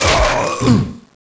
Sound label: Critical Stop; Diablo; Desktop Themes